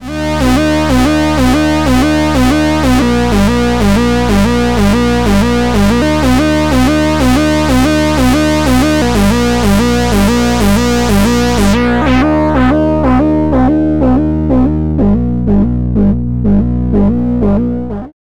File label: Pittsburgh Lifeforms SV 1 Wobbly